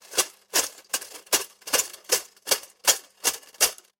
voin shagaet metallicheskiy zvon; zvuki dospehov

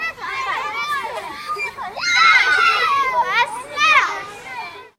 detskaya ploschadka kriki i kacheli

